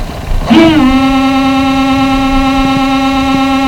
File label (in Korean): 컴퓨터시작소음